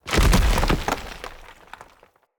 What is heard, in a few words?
Stag Gallop Charge Vegetation, Footsteps; Animals; Foley